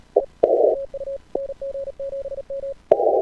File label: Key - Unkey Pop Samples